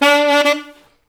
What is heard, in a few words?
SAX A. STAB, Alto Section; Roland LCDP07 Super Sax; Alto Sect FX